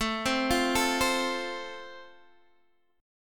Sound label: A Minor Sharp 5th, Guitar, Open D